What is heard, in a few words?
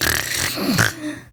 Snores, Daughter, Vocalizations; Warframe; Deimos